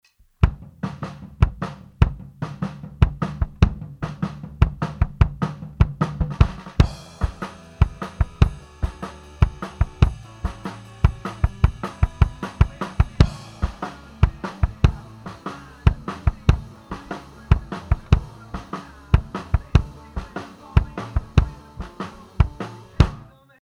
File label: kick, EV-664 dynamic on Kick; Radio King kick; Ludwig toms and snare